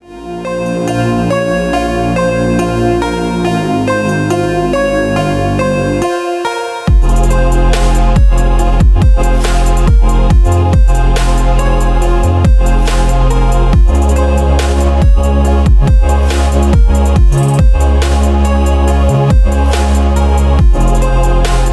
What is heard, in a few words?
dark trap